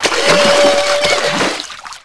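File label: flipper death; lmctf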